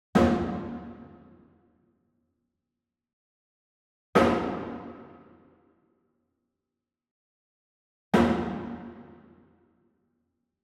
WOOD PERCUSSION Wood Drum Floor Hit Slap Far; boom library; Cinematic Strikes Construction Kit

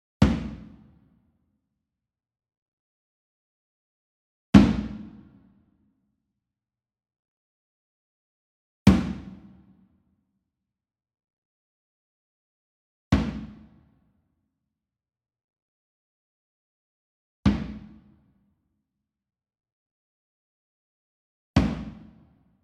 SMALL DRUM Tabla Hit Hard Beater Medium; boom library; Cinematic Strikes Construction Kit

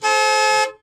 66 Cobra Horn, Automobiles

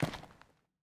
Footsteps; Hero; Blind Survivor

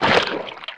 Squish Grab Guts; SFX Kit; Foley - Misc

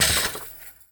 Street Ball Glass Basket Chain; SFX Kit

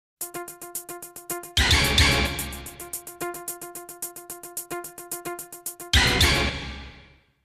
Jingles; 90 DDD Jingles

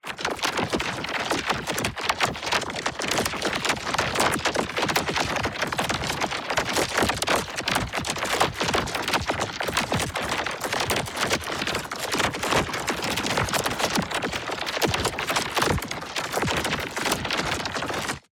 ABILITY CHARGE Flurry Strings; boom library; Matter

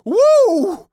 police hit good short; donnie; Blind Drive

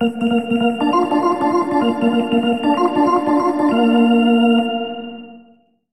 Hockey Organ

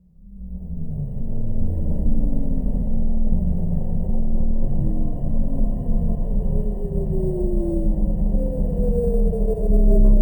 Amb Scifi Energy; Energy & Forcefields

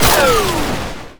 Menu Scifi

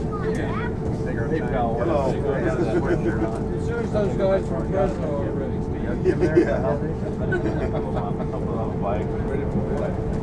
train subway interior; best service Blue Box

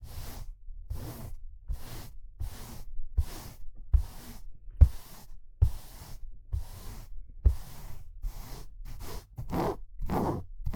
brushing and scratching bed; human; NS Studios - ultra sound collection

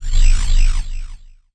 Scifi Synth Tone Disable; Energy & Forcefields

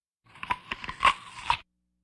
Vocal effects breathing Moaning Baby

